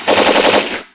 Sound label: Machine Gun; Mad Money; TIPro